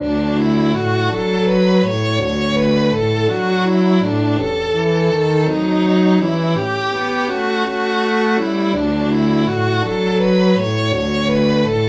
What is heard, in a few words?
Quiet river scene; Violin, cello, piano; synth